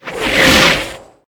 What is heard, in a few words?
missile, aircraft effects